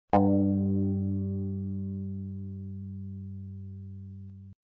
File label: bong